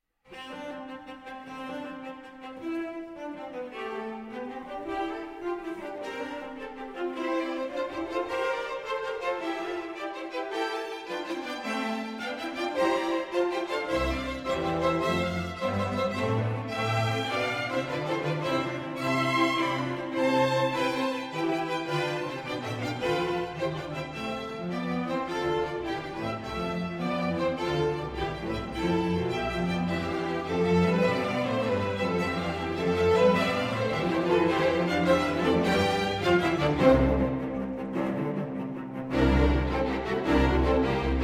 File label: allegro appassionato